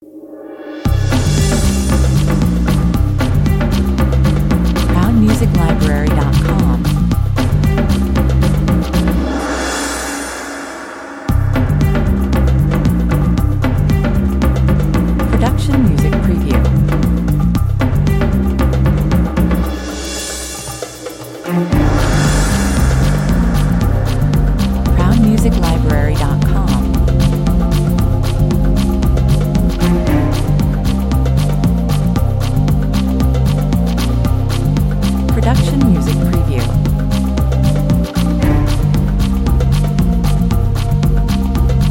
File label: afro ballad 115 bpm